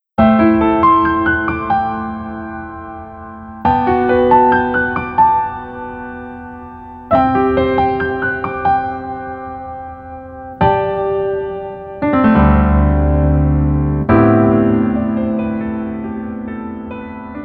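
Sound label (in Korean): Piano version 멜로디 MR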